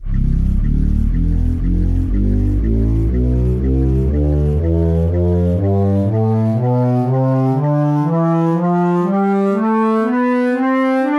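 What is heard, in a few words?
flute scale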